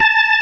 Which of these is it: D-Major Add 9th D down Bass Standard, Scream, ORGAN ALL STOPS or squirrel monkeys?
ORGAN ALL STOPS